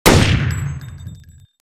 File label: gun; mcheli